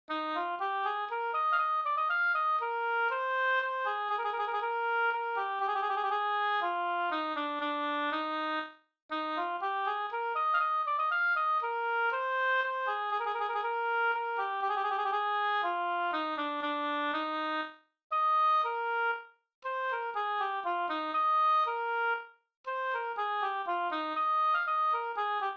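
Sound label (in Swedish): polska Gokpolska fr Småland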